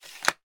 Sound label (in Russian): zvuki podzornoi truby